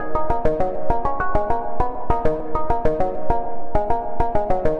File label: Synth Loop 100 Bpm